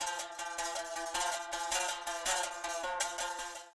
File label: Music; Sifu